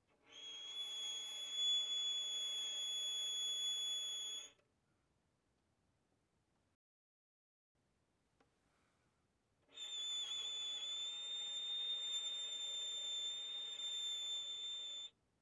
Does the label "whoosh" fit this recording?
No